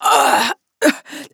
death fem; player

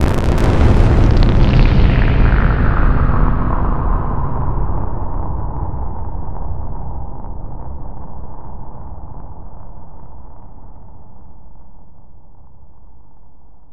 cables blast l; Half Life Alyx; music; vault

